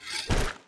hits

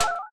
floyd hurt

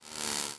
ball lightning move; monsters

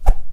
knife miss, weapons

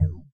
hurt, tengu, monsters

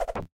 rainerang hit

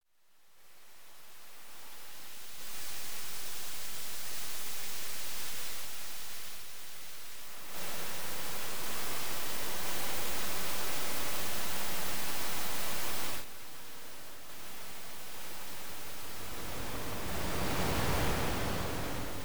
outdoor ocean

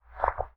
snail die, monsters

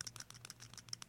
spider move, monsters

